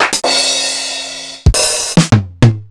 Sequential Circuits Tom

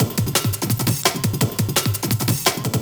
170, Breaks; EZRollers

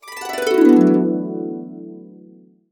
D, Harps; USB Soundscan vol.62; AKAI

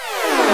SYNTH ZOOM

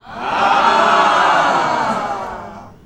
AAAH; ClapsOohsAahs; SFX

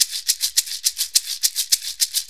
Congas, Perc Loops; Sonic Foundry; Drum Tools

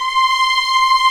C6 LEG MFI R, String; East West; The Ultimate String Collection

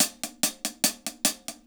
HAT, HI HAT LOOPS, Dub; Drumdrops In Dub VOL-1

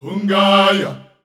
Hungaya B 120, Voices Of Africa Sung Phrases

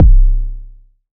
SERGE Kick, drums; Polyend; Stazma & Concrete Collage; Concrete Collage Drum Kit; Concrete-Serge Drums

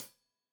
Hat Tight, Hi-Hat, Cymbals; Deeply Sampled Drums

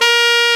SAX A. MF A, Alto Short; Roland LCDP07 Super Sax

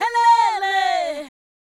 Fem Vocal 128bpm G FX, African Voices

